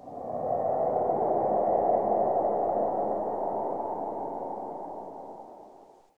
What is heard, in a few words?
stinger; slender skinwalker